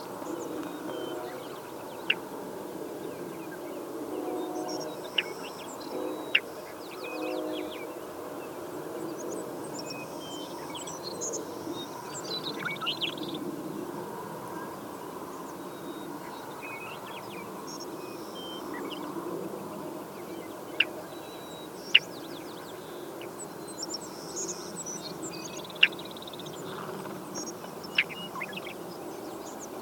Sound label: Western Meadowlark, Sturnella neglecta; blackbirds orioles